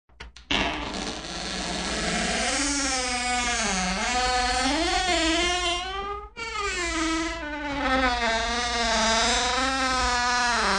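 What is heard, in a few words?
Exaggerated door creaks; Utrirovannye dvernye skripy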